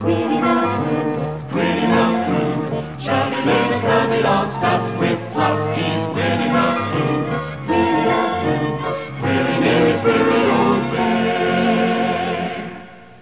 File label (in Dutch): muziekje